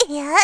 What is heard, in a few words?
attack; Eternal Return; Tia; Voice Line; KOR